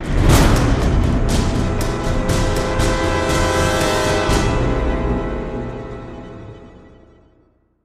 PERC BRASS SWELL; STNG TRYPSTATION

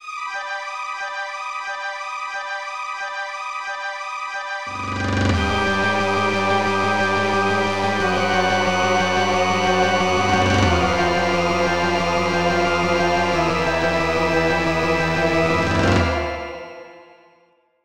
Cinematic Trouble; sonic epoch

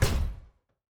Footstep Robot Large

